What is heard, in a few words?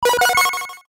Videogame alarm; Nokia N79; Audio themes